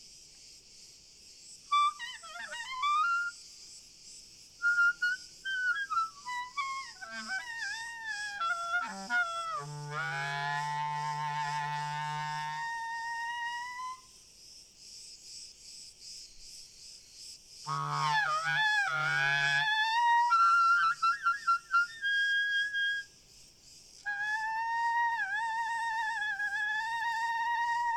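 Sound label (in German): Frei OTon Spiel Grillen Chor Fern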